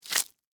Sticky Fast Rip, Texture, Sticky, Fast, Rip, Tear, Rubber, Gloves, Movement; Cleaning Sound Effects; BlastWave